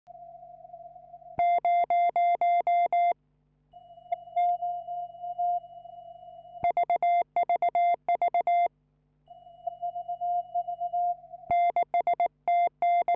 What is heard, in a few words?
another echo